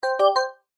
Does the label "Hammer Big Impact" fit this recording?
No